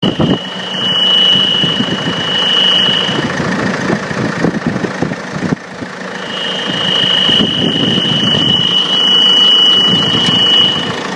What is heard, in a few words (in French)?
bruit 307 hdi 110